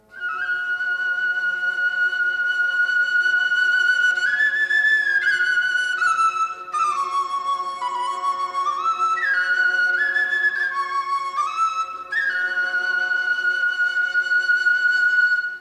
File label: Dizi